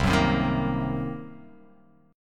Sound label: C Minor 7th Flat 5th, chord